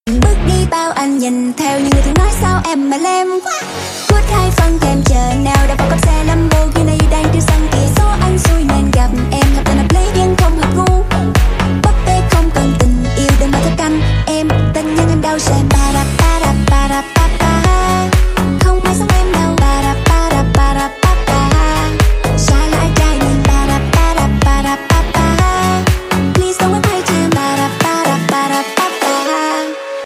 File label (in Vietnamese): Nhac Chuong Remix